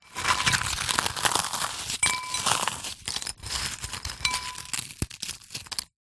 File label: food biscuits crush and drop in ceramic bowl